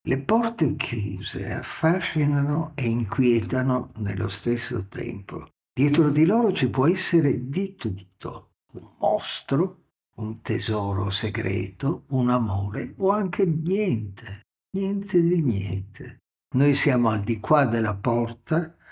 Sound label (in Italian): porte chiuse